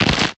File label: asteroid damage small; Factorio